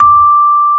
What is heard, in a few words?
D 1, Electric Pianos; Wizoo